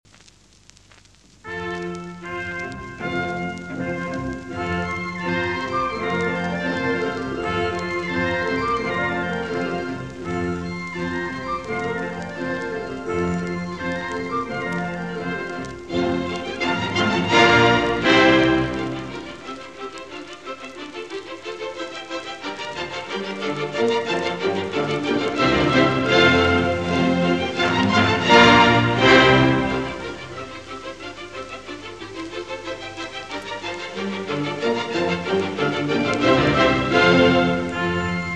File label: ronde, moderato con moto; Röntgen; Six Old Dutch Dances